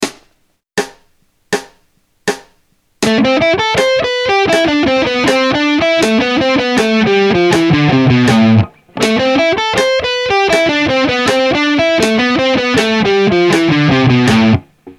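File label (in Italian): Quartine Terzine, Linee solistiche precisione ritmica e timing, rock guitar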